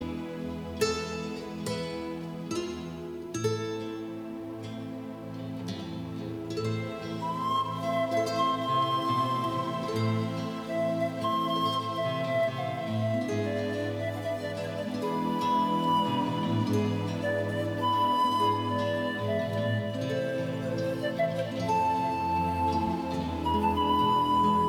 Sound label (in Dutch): fluit